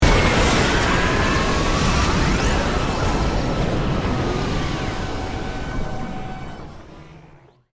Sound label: Stinger Sweeper, Production, Element, Stinger, Sweeper, Descend; BlastWave